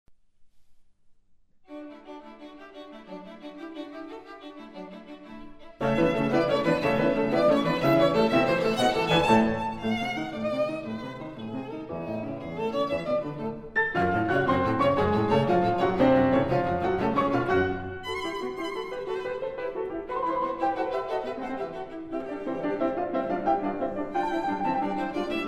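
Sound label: piano trio; franck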